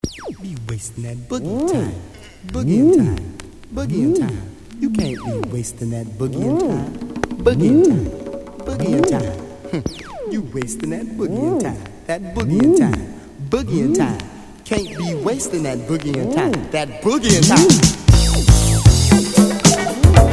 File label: DISCO Instrumental